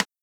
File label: click